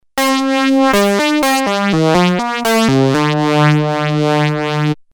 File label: altair fmlead